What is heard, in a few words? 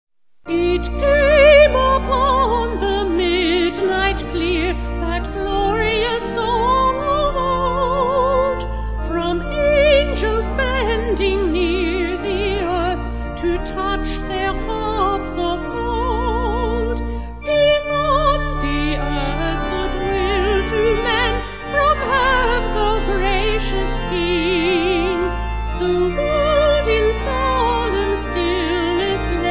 Vocal Organ; It Came Upon The Midnight; Carol